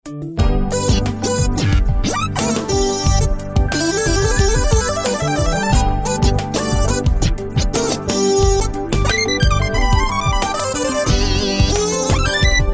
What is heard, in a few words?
jam